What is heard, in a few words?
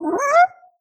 Squeaky medium; CinemaSpice; Mograph Mini Transitions